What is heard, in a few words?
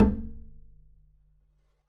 perc, pizz; meatbass